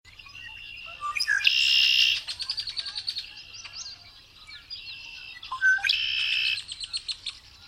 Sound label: red winged blackbird; Animals